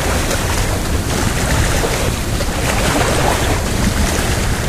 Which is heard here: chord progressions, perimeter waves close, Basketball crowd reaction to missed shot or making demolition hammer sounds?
perimeter waves close